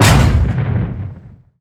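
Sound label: explosion asteroid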